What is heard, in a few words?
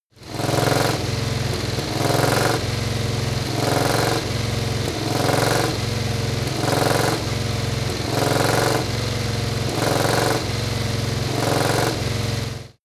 motorcycle harley engine rev roar sequences hard